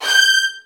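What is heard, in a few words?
violin, G4, left; Virtuoso Strings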